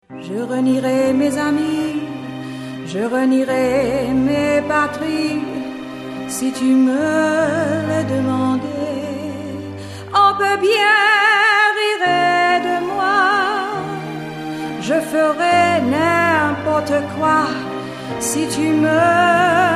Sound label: Bassline